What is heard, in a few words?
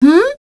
humm; BattleSNDS